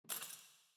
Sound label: tools